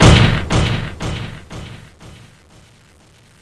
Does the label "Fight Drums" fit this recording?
No